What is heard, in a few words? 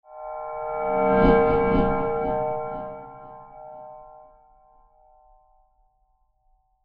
Mysterious Metal Transition With Delays; Scary Soundscapes; CraftedSignals